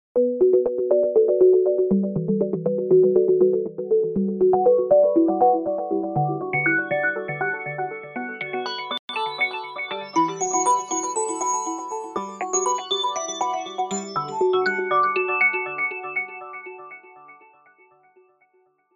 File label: Instrument crystal drops